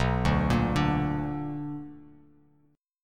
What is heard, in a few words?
B-Minor Major 7th B down